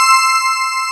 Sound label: HOUCEY CHORD